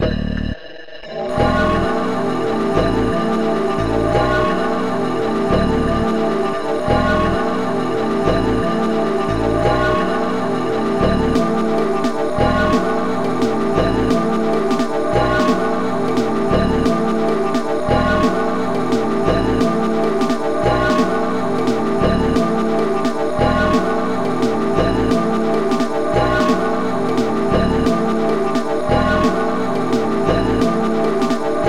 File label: bog song